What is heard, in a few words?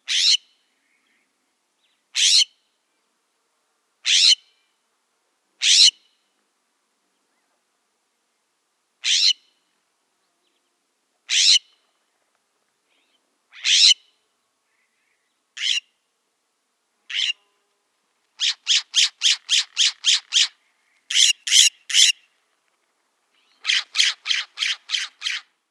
Western Scrub-Jay Calls